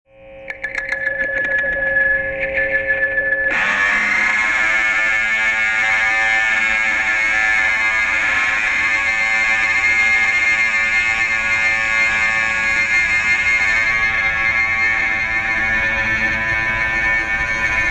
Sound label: hair cut CLIPPER tone